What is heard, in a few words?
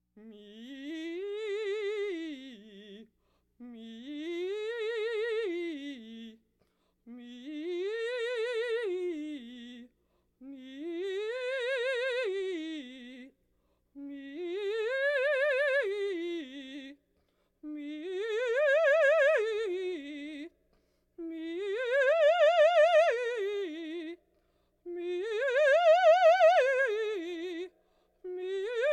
Laugh tracks